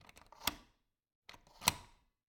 Cabin, front door, deadbolt, lock, latch, thick, open close, wide; Eiravaein Works; Vaeyan IV